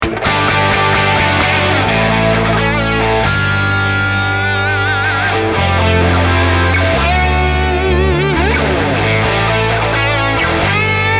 one way to the top solo without drums without click